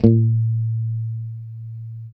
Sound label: B3 sus, Strat guitar; Spectrasonics; Hans Zimmer Guitars VOL-1; JC-STRAT MTE